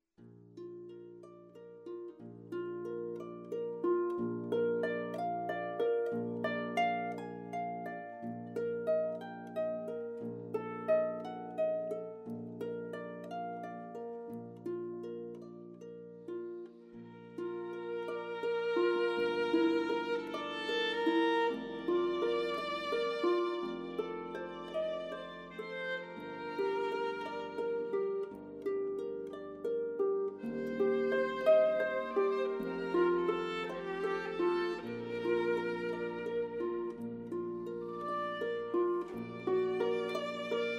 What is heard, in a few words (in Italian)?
arpa violino